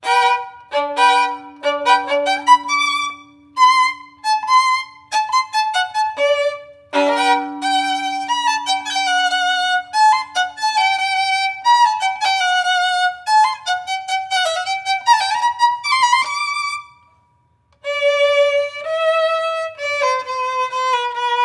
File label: Classical